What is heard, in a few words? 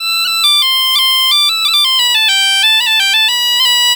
EM BP, Celtic Flavours, BAGPIP090; USB Soundscan vol.42; AKAI